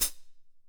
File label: HAT CL; Q Up Arts; Denny Jaeger Private Collection